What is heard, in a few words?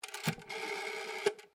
TELEPHONE OLD ROTARY BAKELITE DIAL